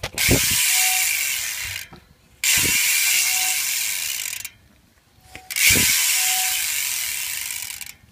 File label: profile buzz